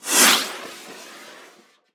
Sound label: woosh, firework; CosmicRage